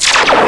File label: FIRE; Empire; sonix; chipmusic